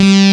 TALK SYN BASS; Pro-Rec - Synthsonic; BASSES

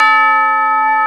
tubular bell; Greytsounds VOL-1

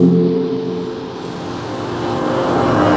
FX, Futurist Drum & Bass

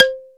barafon; SN-R8-07 Mallet

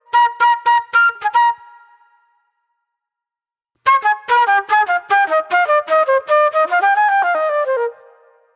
Raw Funk Flute; Sonic Foundry (Sony Creative Software); Manhattan Flutes

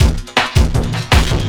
Drum & Bass, 160 BPM

